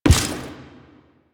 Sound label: Step Default, Footsteps